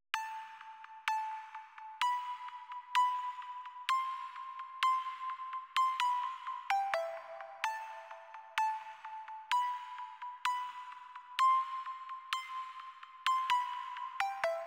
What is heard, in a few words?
128 BPM A Min Lead; Breakdown Loops; Infinity